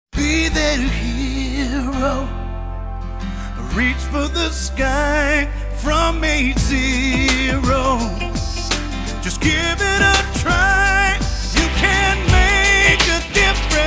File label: jingle sing; RTS II